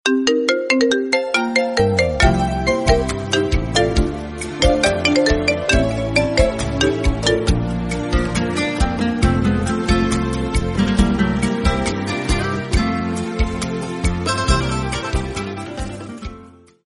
iPhone 12 Pro Sertanejo

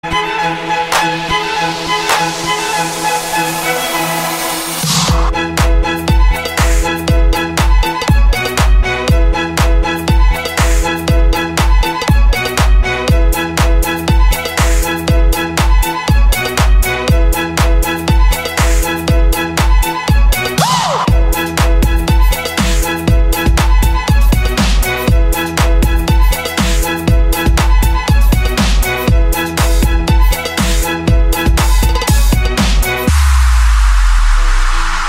Vivaldi Spring, Instrumentalny

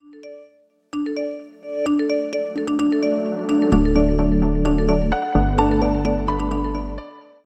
iphone msg remix

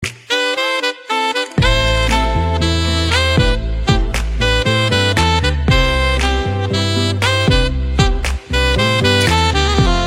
Saxophone; Dzwonki na telefon

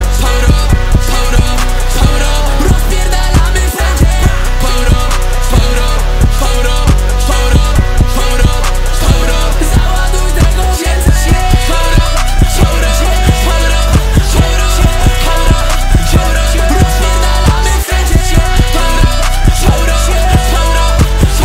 power up

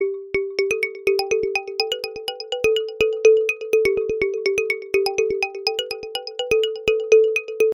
samsung s8 cool, dzwonki

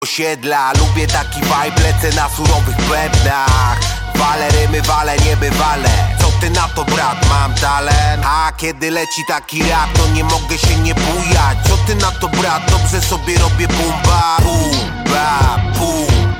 boom bap

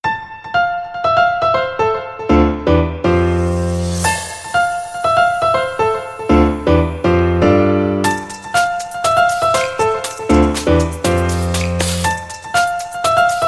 iphone progressive

